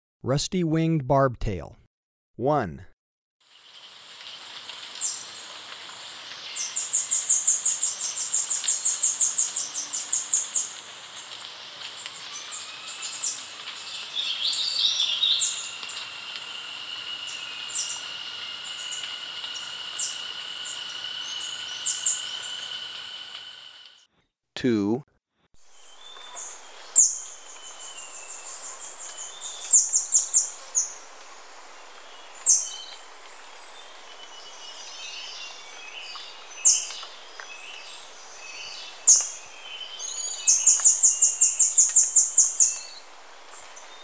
Rusty-winged Barbtail, Birds of Ecuador; S. America

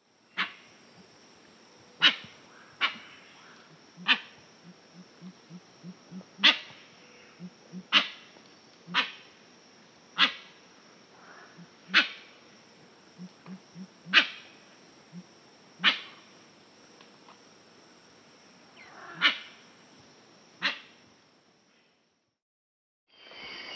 Pale-winged Trumpeter (Psophia leucoptera), Peru; S. America

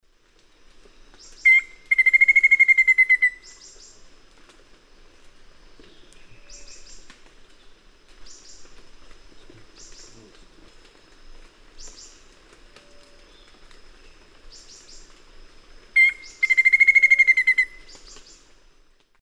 Formicarius analis, song; S. America; Eastern Lowlands

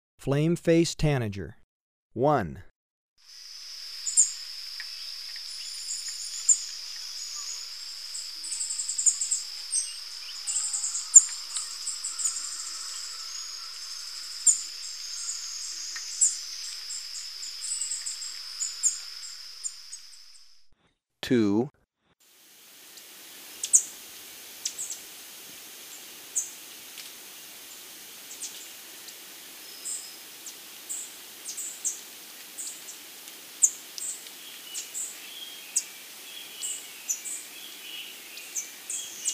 Flame-faced Tanager, Birds of Ecuador; S. America

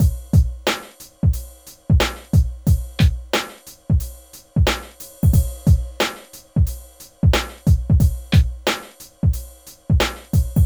HYDROPONIC HIP-HOP

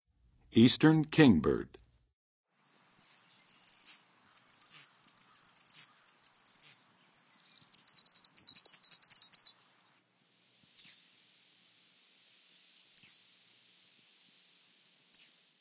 Eastern Kingbird, Songs of Birds; North America; Western USA; Western USA Songbirds

